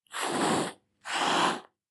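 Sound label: sounds cat hissing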